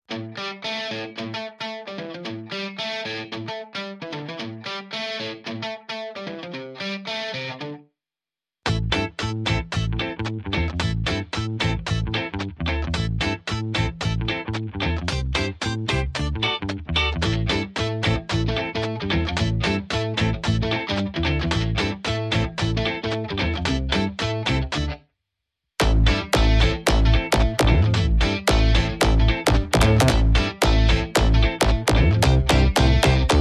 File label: Big Band Beats, No Drums No Leads